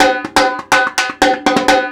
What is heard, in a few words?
Percussion Loops, Brazilian; Houseworx